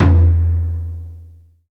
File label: C3 TAIKO; roland1